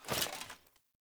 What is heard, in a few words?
Foley Melee Swing Armour; Characters; Enemy; Melee Grunt; Sinecure